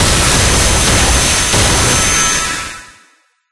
Particles; Life of a Naomi

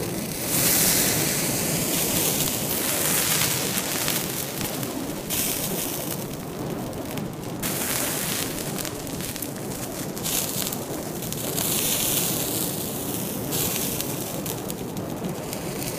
Fire; Life of a Naomi; bgs

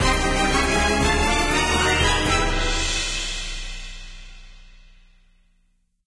Defeat; ruu goblin quest; Naomi Carrigan

